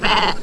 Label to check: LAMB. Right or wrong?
Right